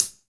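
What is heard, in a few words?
hi hat; Best Service Gigapack 2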